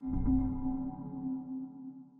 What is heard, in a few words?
communication sound tutorial